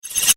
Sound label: Laser Handcuffs, science fiction sound effect; Laser Handcuffs - Prisoner; star wars / trek style games